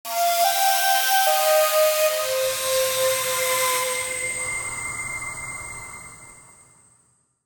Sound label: Blown; Sony Ericsson; Venus (Xperia X1)